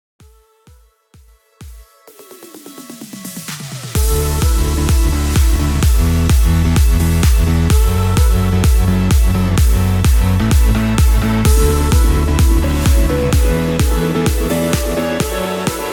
PROGRESSIVE CUT 128 BPM

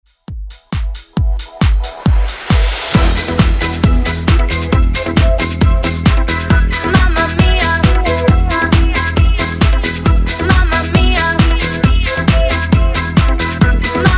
90s Pop Redrum

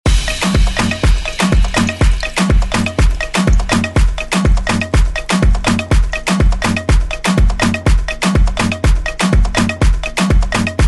Afro House ReDrum; DJ Mhark

